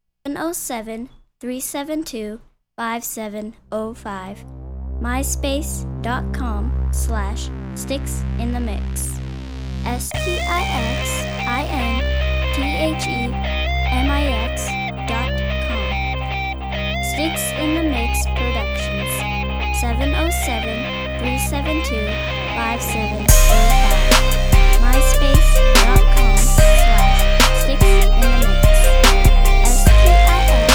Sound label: rock crunk